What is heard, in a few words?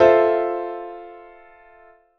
F Major 7th harmonic intervals